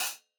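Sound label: HI HAT CLOSED MED MED CLOSED HI HAT, analog recorded drum; Sonimus; s series drum pack; STONEQ 4K; SATSON; SATSON CS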